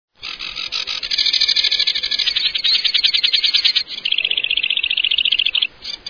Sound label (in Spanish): canto Carnicerin Comun